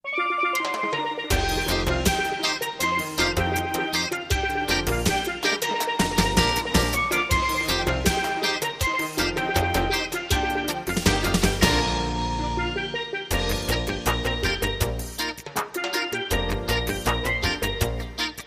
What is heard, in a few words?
calypso cruise